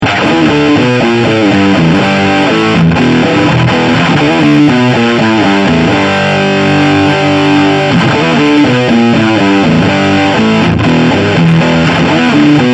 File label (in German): MXR Vergleich Dist III H